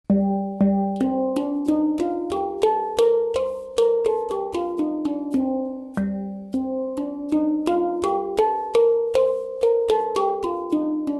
hang; Sadjagrama

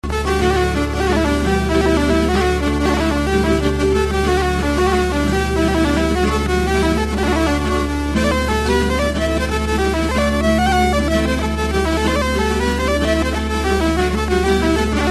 e bagpipe

